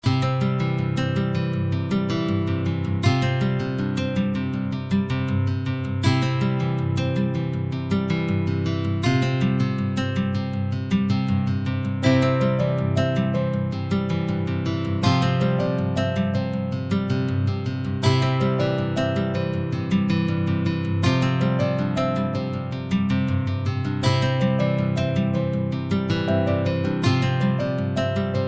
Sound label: Simple Wave